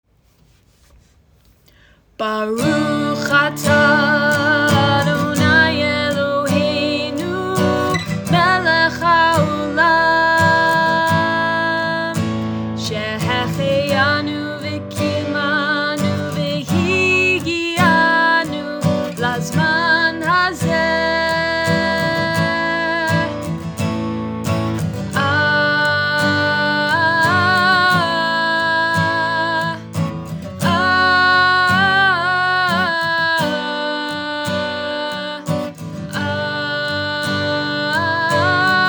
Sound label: Shehecheyanu; Prayers